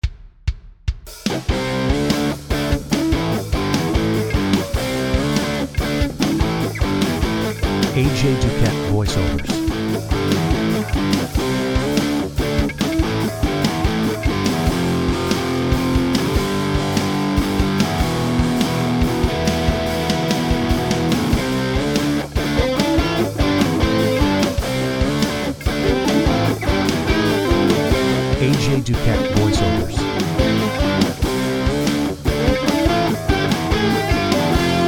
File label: Rock